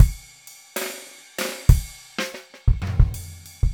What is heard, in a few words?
064 Jazz Swing Drums; Trad Jazz; Pro Tools Essential Loops; Digidesign